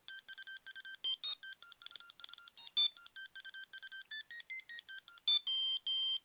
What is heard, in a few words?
ericsson t39 ringtones